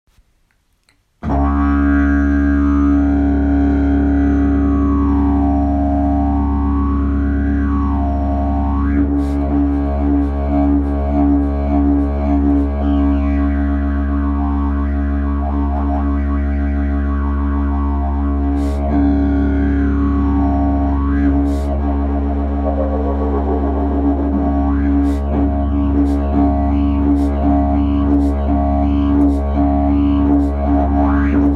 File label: didgeridoo; olse bleskoles